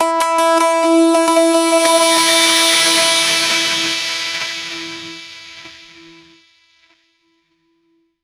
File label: e2 g2 b2 e3, dreamy